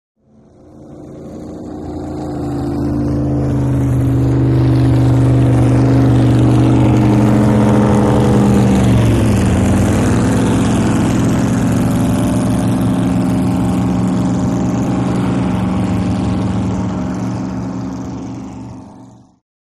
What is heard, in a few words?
Drag Boat By Slow sev